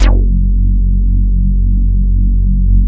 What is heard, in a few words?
JUNO 106; Masterbits; Vintage Voltage; SEQ-FX